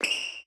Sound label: squeak